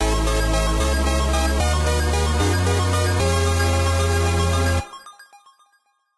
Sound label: fanfale intro; Cave Story Repainted